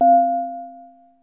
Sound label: synth gong; DRUMS & PERC